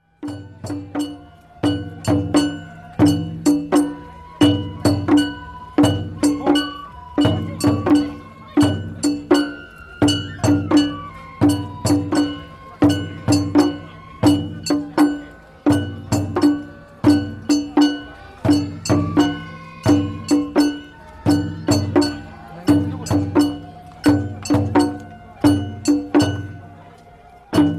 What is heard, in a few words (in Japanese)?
弥生祭お囃子; 石屋町